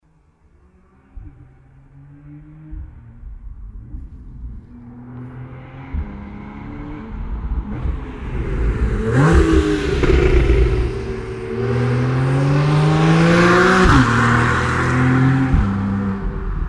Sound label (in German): 911 Carrera S (Sportabgasanlage)